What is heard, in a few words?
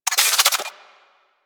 Rail Gun Reload Mech; Weapons; Warframe; Archwing